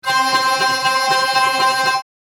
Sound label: Hurdy gurdy reps medium